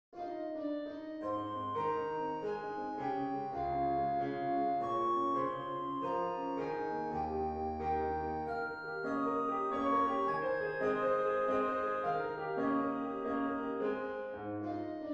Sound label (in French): valse; beethoven ludwig van